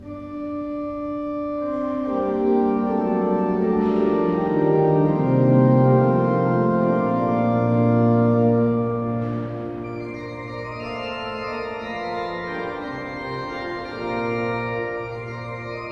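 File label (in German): Brandt, pfeifenorgeln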